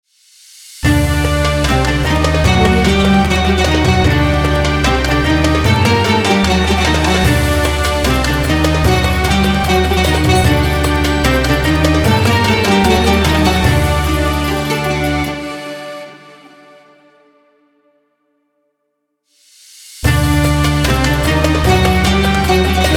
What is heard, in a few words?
ramadan logo arabic